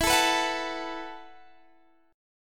E Suspended 2nd Suspended 4th E, down, Guitar, Standard; strummed; JGuitar